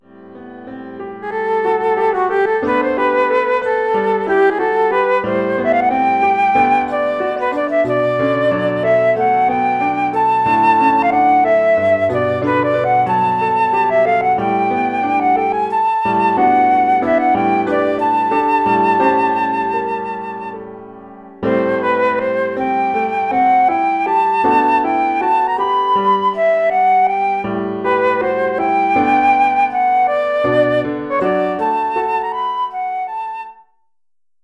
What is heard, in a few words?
flute solo; flute solo + PA